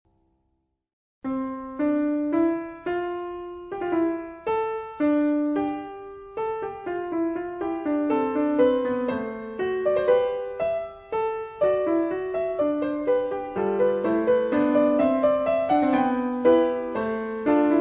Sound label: Fuga in C; Bach BWV846 Praeludium et Fuga in C